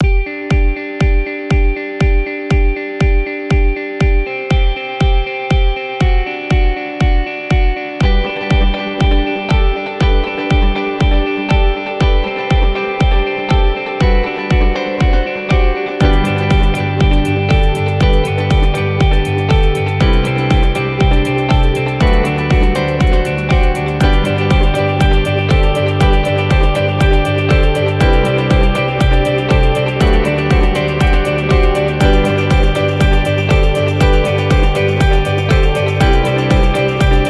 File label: Motivational Sound; corporate music vol 15